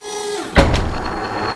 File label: Chain Gun Load Snap; Defiance